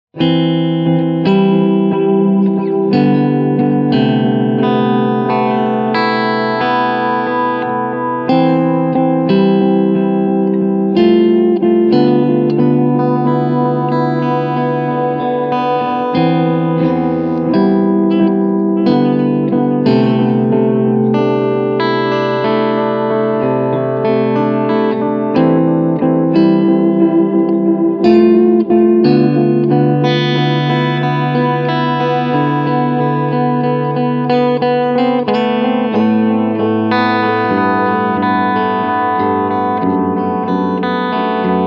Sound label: Long Delay NO Mod